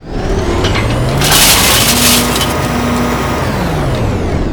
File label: metal gather, trolley; general trolley